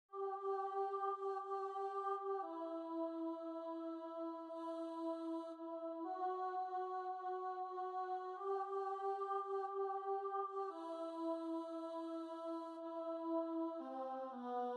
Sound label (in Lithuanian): ALTAS GARBE Šv Augustino mišios